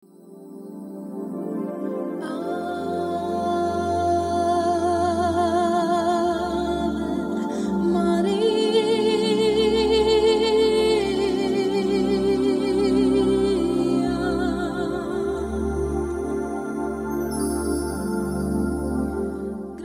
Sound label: JINGLE; SZERETETLANG RF.